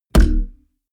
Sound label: heavy saturated damped, hits impacts; whooshes rises